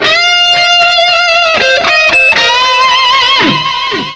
guitar solo; Multimedia Studio

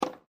bounce

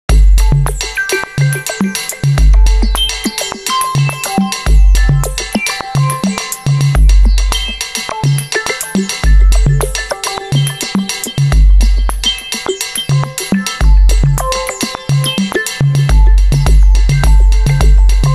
Ring BeatPulse; HTC S740

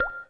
raindrop; Samsung; GT-B7320 Omnia PRO ATT

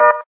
gayageum, keytone

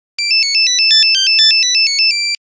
Etude, Nokia Buzzer; Windows Phone apps

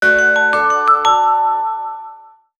Alert; Sony Ericsson; W902; PlusFourSix; Patti; Lars Edvall

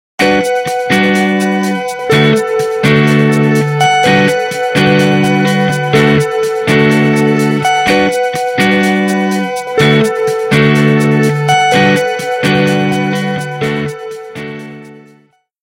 Ripple, ringtones; Nokia; 5130 XpressMusic

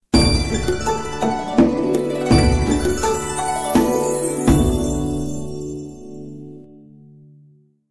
Gliss tune, ringtones; Samsung; GT-B9120

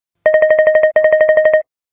Xylophone, phonetones Nokia N8; Nokia N86

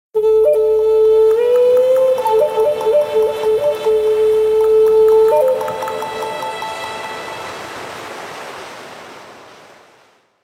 Nokia 3600 slide Ringtones; Pearl

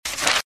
Flicking Sound

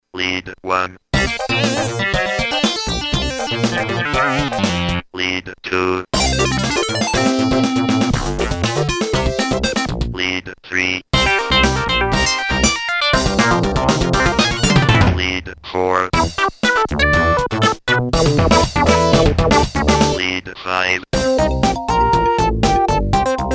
Lead 4op

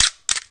camera click

